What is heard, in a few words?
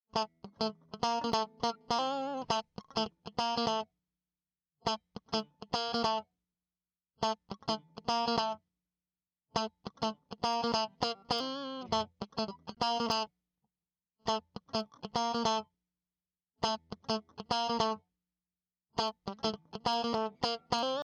Strat Clean